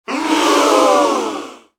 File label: Crowd surprise sigh reaction; sitcom